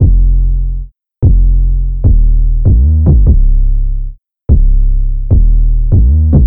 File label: Hip Hop Rap